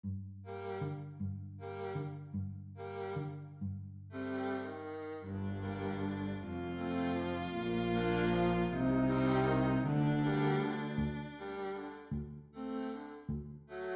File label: Venetian Boat Song, orchestra music